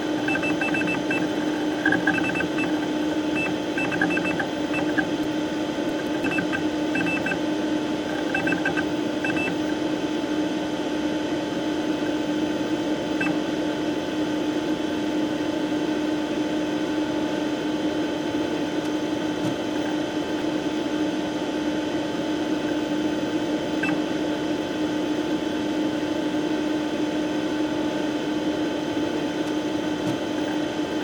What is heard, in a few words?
Radio Station BG; RadwinIsland